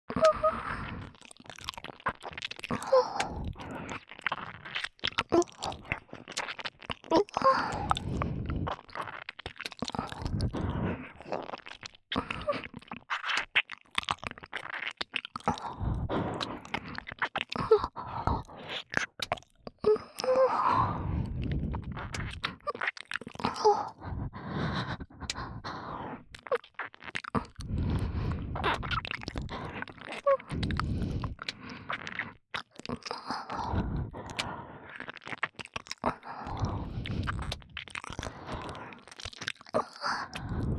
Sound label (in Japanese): (右) ねっとりしゃぶりつき耳舐めエッチ, ASMR; 白耳; パンパン系効果音版